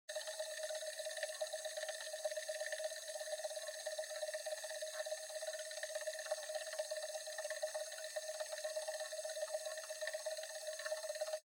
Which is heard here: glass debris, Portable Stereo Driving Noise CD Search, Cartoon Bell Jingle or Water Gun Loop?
Portable Stereo Driving Noise CD Search